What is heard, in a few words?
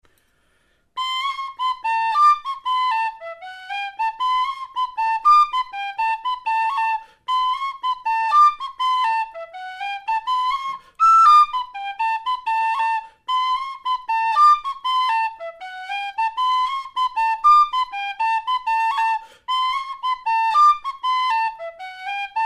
Kilkenny Jig, whistle